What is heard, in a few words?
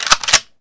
Spas12 Pump